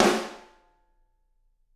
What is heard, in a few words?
SD, Drums; Bob Clearmountain Drums I